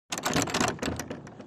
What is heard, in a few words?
rattle; notifications